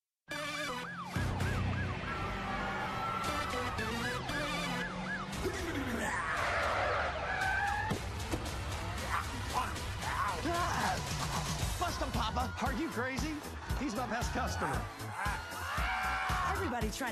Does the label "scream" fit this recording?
Yes